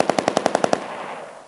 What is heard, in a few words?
AK-47